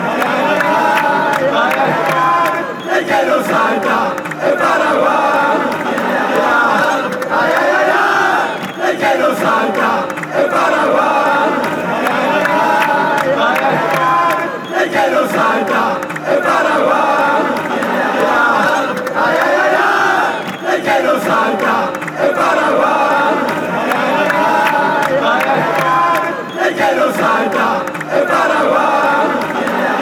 who doesnt jump, fan chants; paraguay

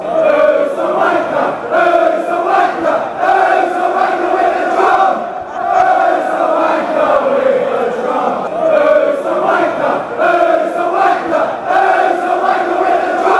drum; whos wnker

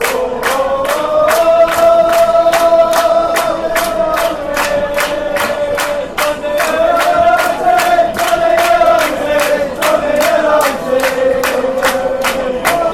woah, fan chants; dundee united

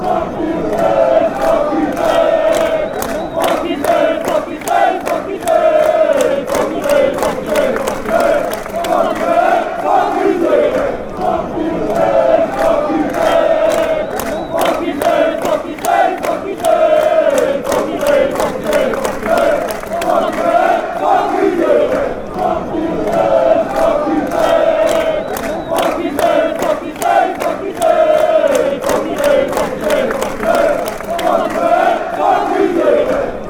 cup of tea, chants

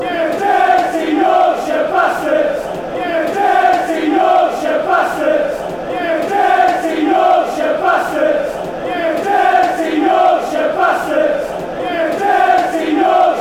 dirty yorkshire bstards

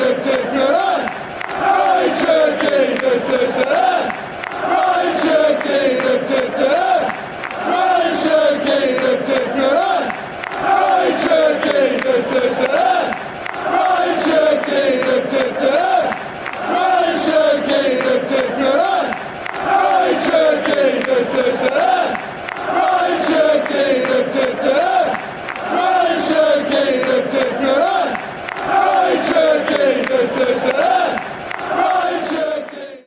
player chant; rayan cherki; manchester city

